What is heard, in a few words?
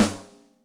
UnclARim, Electric Snare, Yamaha DTXpress